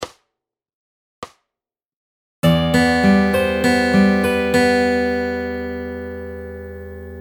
Fingerpicking Lick Variation; Fingerpicking Fun

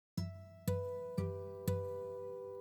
Harmonics

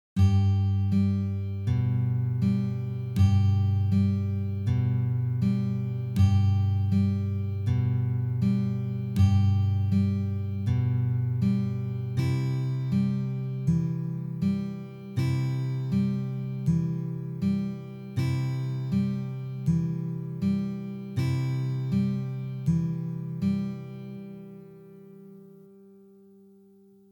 slow

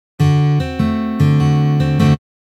Blues Guitar Fingerpicking Pattern D7

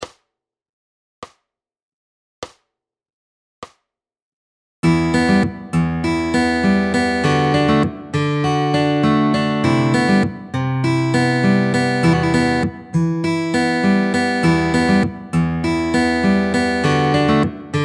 Fingerpicking Both Parts

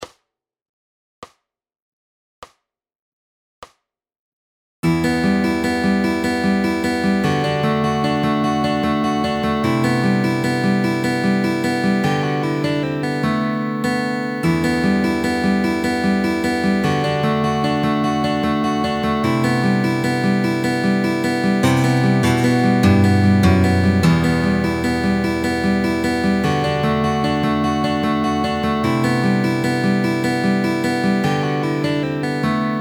Fingerpicking Fun Solo Jam

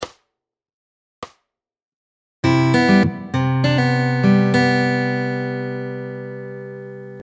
Fingerpicking Lick; Fingerpicking Fun

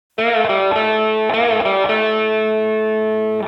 Lick

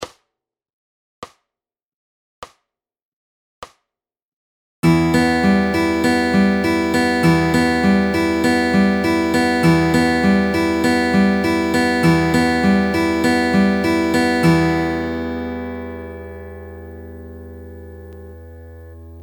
Fingerpicking Pattern E minor; Fingerpicking Fun

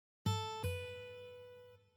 Hammer Ons

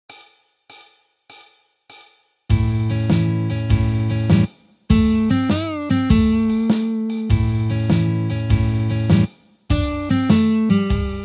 Blues Guitar Example

